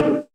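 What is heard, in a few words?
distorted bongo; Drums In Your FACE!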